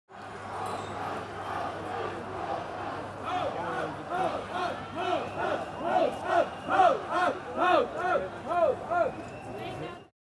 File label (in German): Gegroele